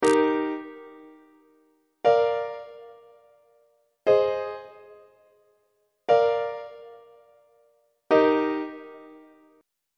d sharp major perfect authentic cadence on treble clef